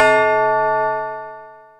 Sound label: TUBELL LO, TUBULR; GONGS+TUBULR